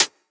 Closed Hihat; Cymatics; Humble Hip Hop Sample Pack; Cymbals

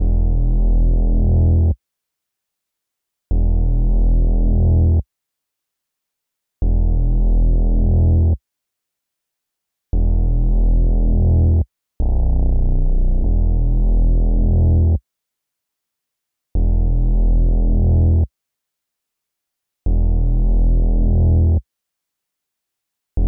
145 BPM E Min Bass, Drill; Melody; Loop Stems; Cymatics; 2022 Melody Collection; Flows

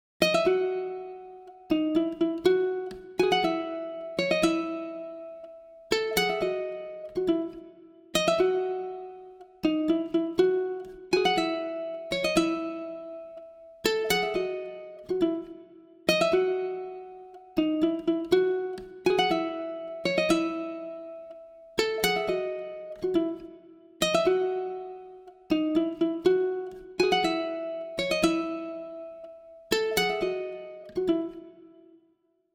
121 BPM C# Min Ukulele; Loop Stems; Cymatics; Ashtray

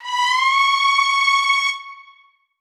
FX Stringslide C#; FX Collection; Hip Hop

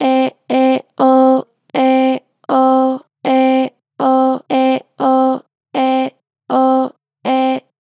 Eh Oh, Vocals, Pitch Flat Gentle; Harmor Vocals

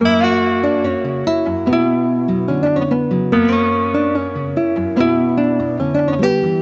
Various Melody Loop 145 BPM B Min; Cymatics; 2020 Melody Collection

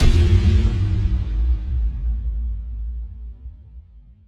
Blur Impact, Dubstep; Ghosthack - AC2024 - Day 13 - Dubstep Sounds 2025; Effects

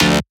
Techno Synth Shot D; Synth One-Shots; Ghosthack; 45 Free Techno Synth Shots 2025

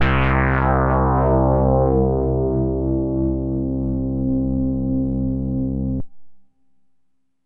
ARP2600 BASS Reaper High - C; Synth; One Shots; Cymatics - Fantasy Synth Sample Pack

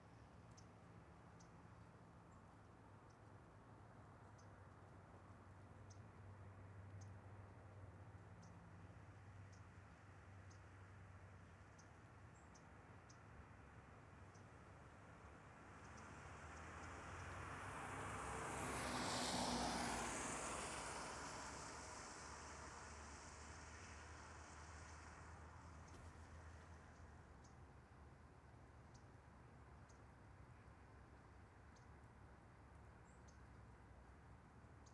Light Rain Wet Car Bys; Fairlight Sound Lib

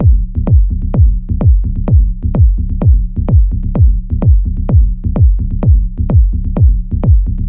Drum Loop Kick 128, Melodic Techno; Glacier

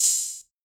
Open Hat; Cymatics; GHOST (Beta Pack); Drum Kit

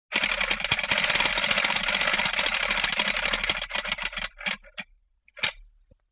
Window Shade Unwind; Vintage Cartoon; Foley

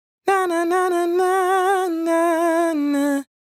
Ad Lib Dry, 140 BPM F# Min, Vocal; Cymatics; Euphoria Vocal Sample Pack; Ad Libs

